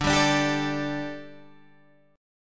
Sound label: D Suspended 4th D, down, Guitar Standard; strummed